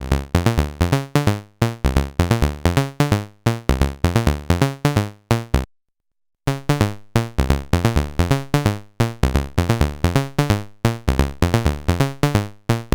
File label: ACL Multi Function Discrete VCO Waveforms